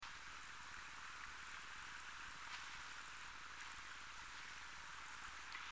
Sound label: Neonemobius mormonius, Arthropoda